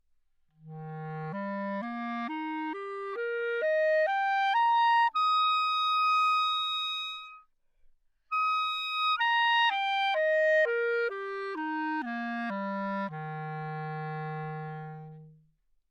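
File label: modern clarinet bb arpeggio, Anechoic; OPENAIR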